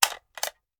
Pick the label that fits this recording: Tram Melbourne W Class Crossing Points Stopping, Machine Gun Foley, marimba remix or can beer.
Machine Gun Foley